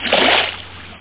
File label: SPLOSH; PowerMonger